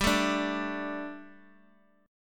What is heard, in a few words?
G Suspended 2nd G, down, Guitar, DADGAD